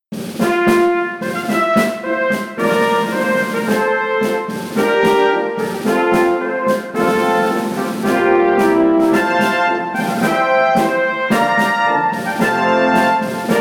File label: Alarm Morning march; Samsung SM-W2014; alarms